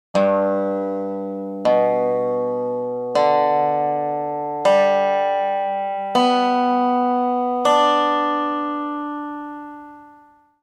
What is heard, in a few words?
tuning check dobro; Hear all strings in sequence